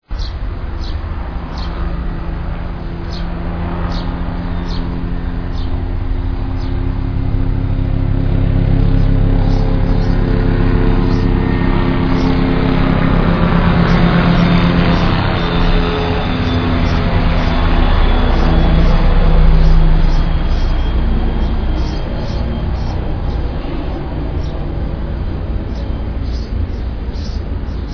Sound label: helicopters